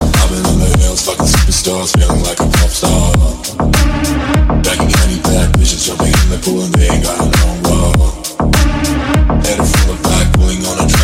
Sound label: english song ringtone